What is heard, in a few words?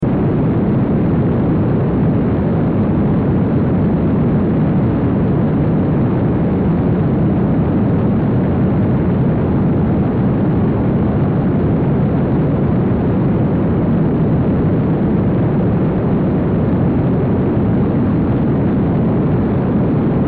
Sci Fi Rocket Booster Space Shuttle Rumble Crackle Loop; Spacecraft